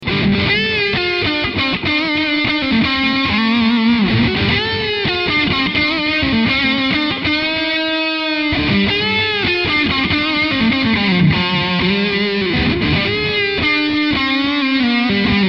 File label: classic lead